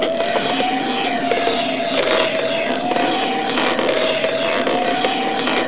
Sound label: long wind